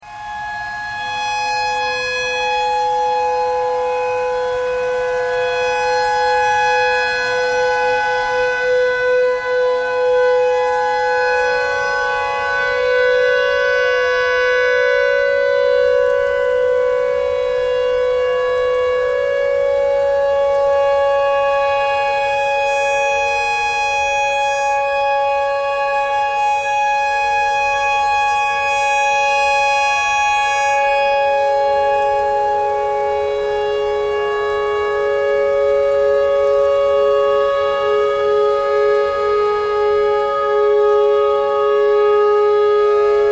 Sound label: clarinet notes e tornado siren thread pentatonic